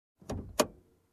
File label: Car middle console click